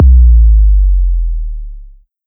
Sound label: long kick